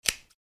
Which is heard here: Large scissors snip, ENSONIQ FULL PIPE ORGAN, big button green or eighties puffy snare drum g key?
Large scissors snip